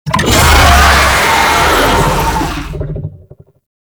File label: Vocal Attack, Infested; Warframe